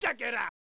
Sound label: COIN; D. D. Crew